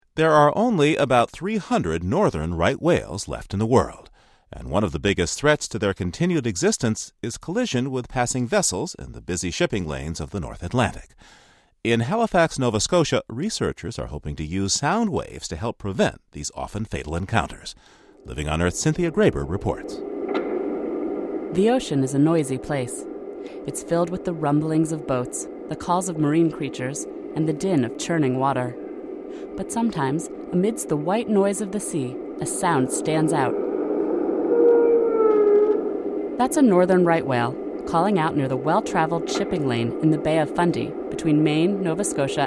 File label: whale sounds